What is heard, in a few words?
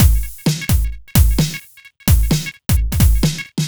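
No Percussion, Drum Loops 130bpm; Zero-G; Soundclash Nu Dance Electro; Acidized Wavs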